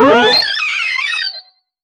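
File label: mission complete notification, achievement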